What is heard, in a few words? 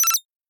Computer Beep